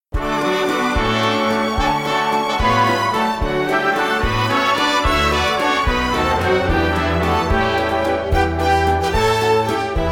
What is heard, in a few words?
Exit Music